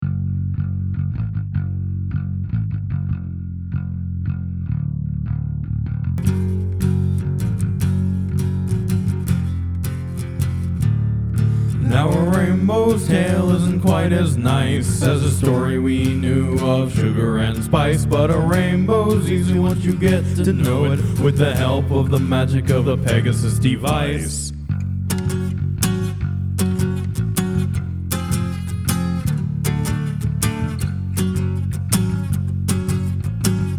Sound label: Acoustic Cover